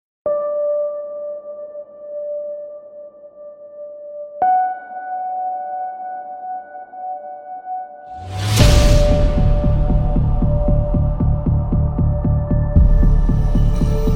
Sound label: Modern Intense Orchestral